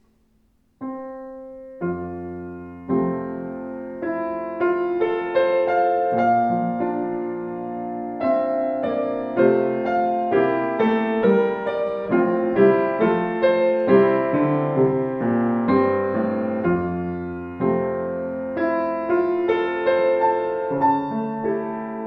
Grotrian, klavier; 124 Grotrian Steinweg Classic 1997 schwarz

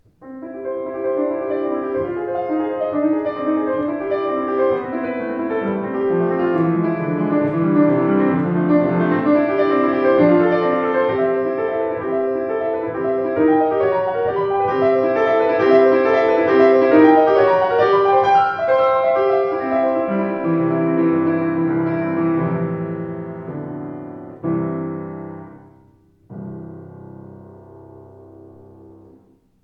Schimmel Kirsche Debussy, Klavier; 117 schimmel kirschbaum

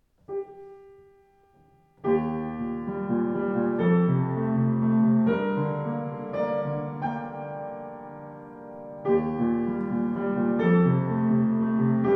Sauter Klang, Klavier; 118 sauter r2 rr schwarz

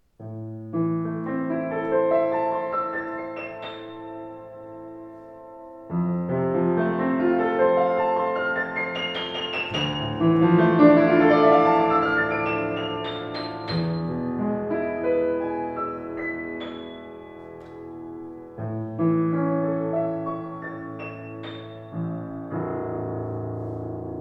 Schimmel Kirsche Kreuz; 117 schimmel kirschbaum

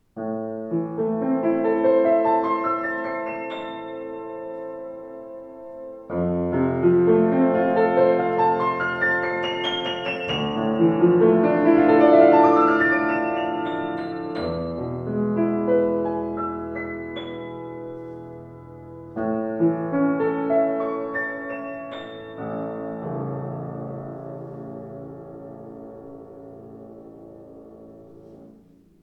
Steinway Z Kreuz; 115 steinway z nussbaum

